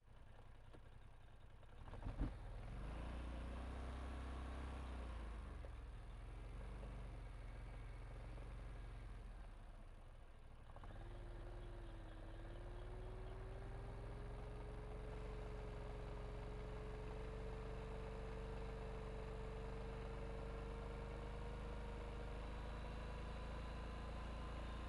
polaris scrambler onbrd ramps drive stop off engine; left; Sonniss GDC